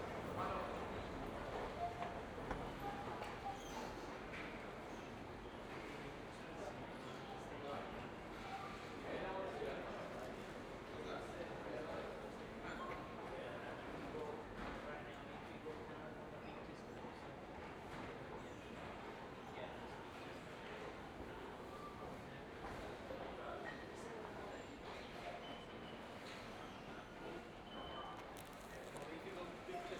supermarket; IEEE AASP; scenes

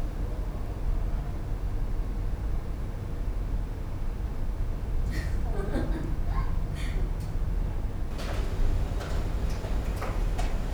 office; IEEE AASP; events office synthetic; snr-6 high

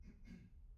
clear throat; IEEE AASP

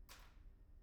pendrop; IEEE AASP

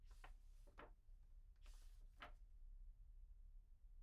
pageturn; IEEE AASP

